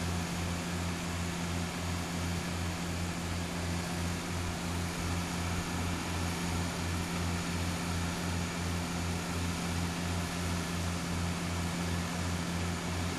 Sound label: generator medium; boneyard; Titanfall 2